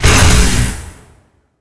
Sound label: electro fire